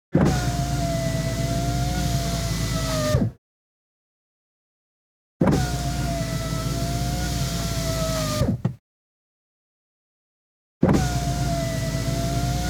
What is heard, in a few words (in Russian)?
Steklopodemniki